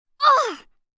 Impact Breathy, Grunt, Impact, 1 child, short, quick hit, groan, moan; kid; human voice; Pain